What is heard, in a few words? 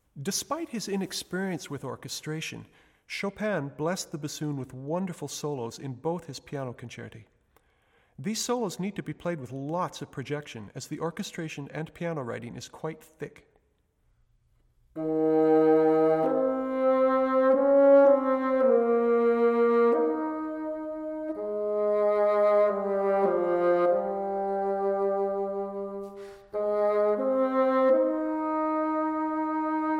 Piano Concerti, Orchestral Excerpts for Bassoon